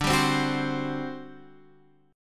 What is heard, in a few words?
G Major 7th Suspended 4th, D